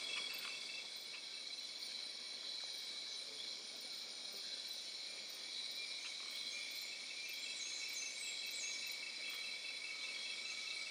Ambience - night tropics; Sim City Societies

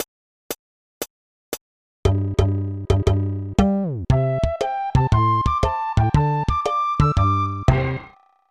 Monkey Dance

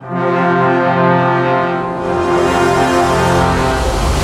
Offgame Preloop Maximizado; King Lucas

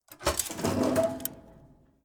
SFX Fireplace Trapdoor; Adam's Venture Origins

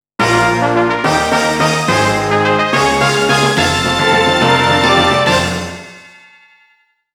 Victory Jingle; Monster Farm 2

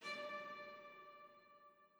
chime old; chime sharp

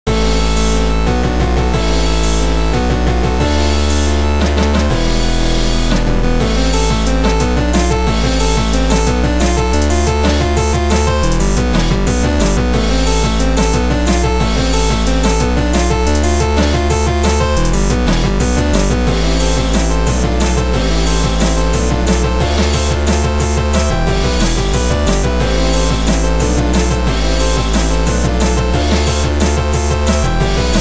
A Minor Boss; mayhem in single valley ps4 switch windows xbox one gamerip 2021

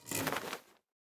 Charge flag; Super Mombo Quest

